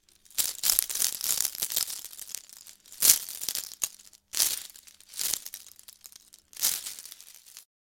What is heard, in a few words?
Seashell necklace jewelry movement